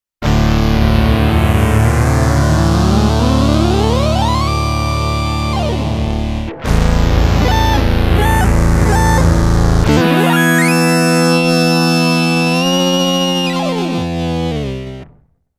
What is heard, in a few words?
Electro Harmonix Bass Mono Synth Spectre